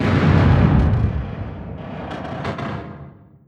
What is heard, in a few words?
distance artillery unit fire; Hearts of Iron IV Death or Dishonor